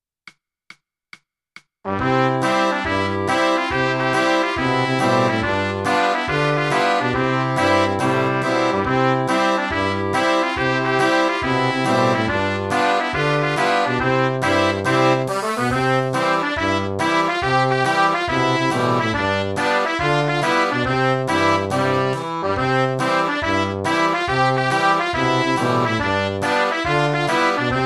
johan pa snippen midi

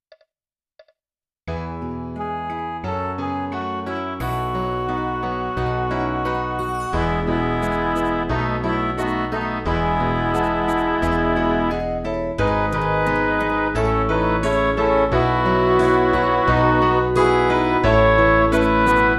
midi

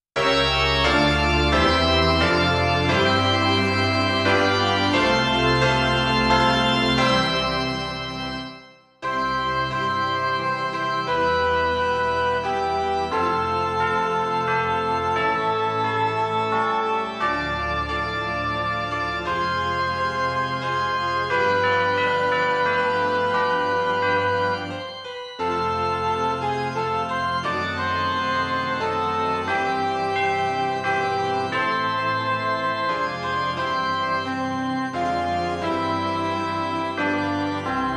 midi

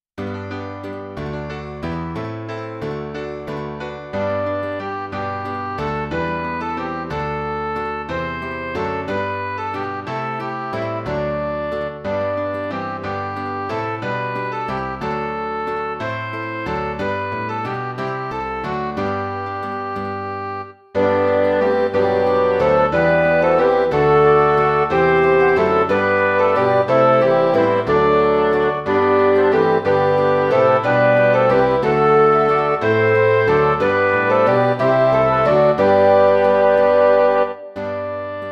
midi

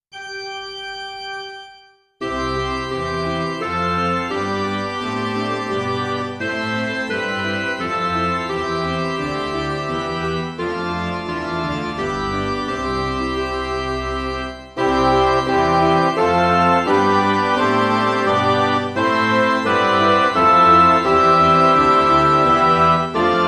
midi

